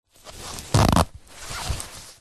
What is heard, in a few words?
foley clothing body warmer nylon zipper short zip